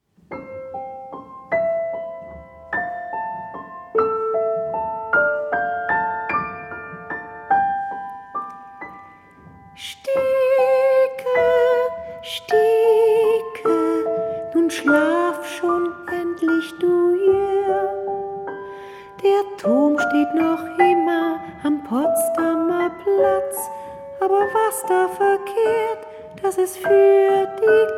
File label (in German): Berliner Wiegenlied